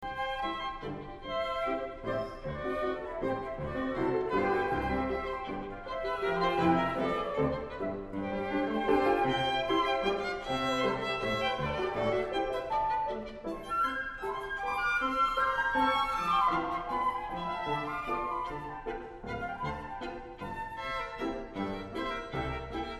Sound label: menuet